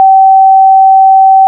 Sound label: bell sounds